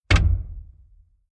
Stinger Car Deep Soild Metal Gear Rattle Impact Thud; Sound Design; Stinger Sound Effects